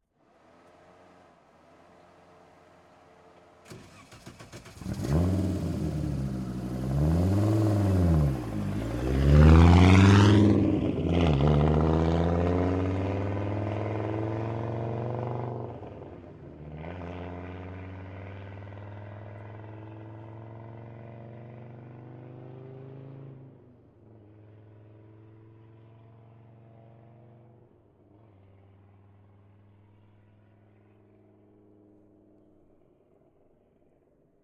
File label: Audi Quattro - EXT - FAST - Various; Pole Position; Audi Ur-Quattro 1983; MS Decoded